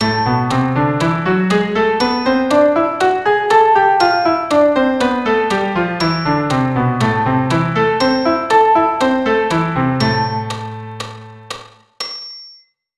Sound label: Am melodic